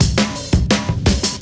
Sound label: Beat Style, 170bpm, breaks